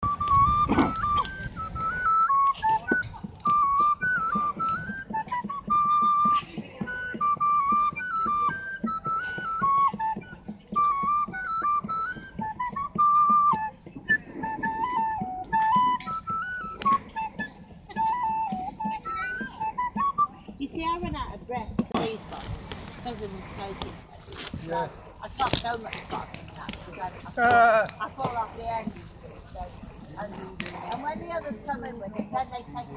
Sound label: sounds of folk festival; ely